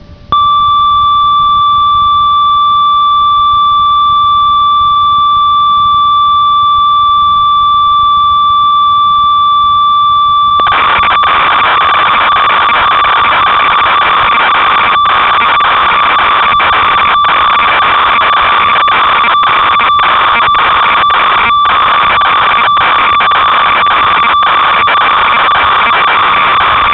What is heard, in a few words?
ob6, synth